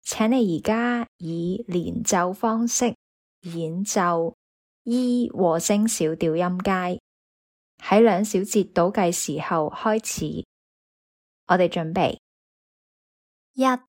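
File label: E minor harmonic scale legato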